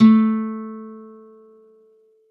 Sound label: Soft A3, Campfire Nylon guitar; Worra's Campfire Nylon